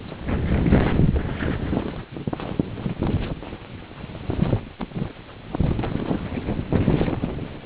flap

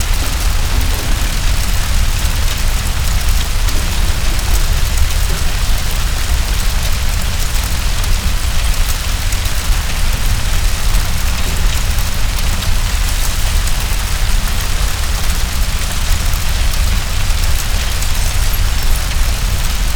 ambience disaster fire

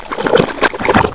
pump

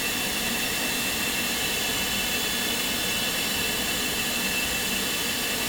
ambience motor

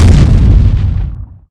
stomp

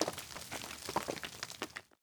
small debris

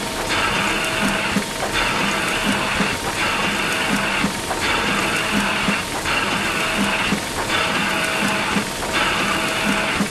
ambience industrial

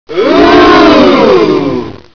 ooooooh

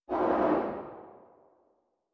gun far

